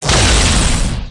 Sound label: shot, railgun